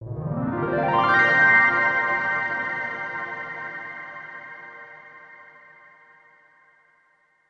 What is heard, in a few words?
Common Flourish